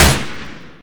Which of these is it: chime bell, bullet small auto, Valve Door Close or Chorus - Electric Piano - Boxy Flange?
bullet small auto